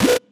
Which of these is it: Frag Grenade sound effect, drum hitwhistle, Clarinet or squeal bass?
drum hitwhistle